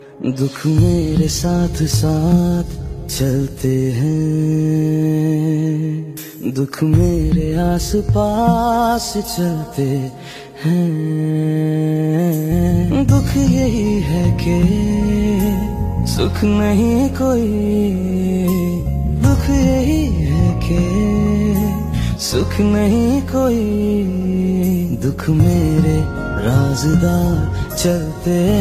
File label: Sad Ringtone